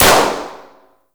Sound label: Balloon Pop; Warframe; Nineteen Ninety Nine